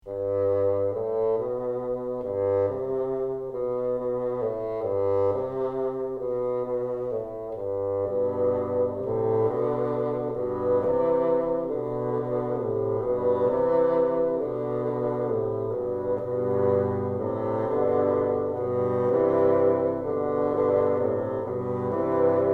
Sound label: trio, bassoon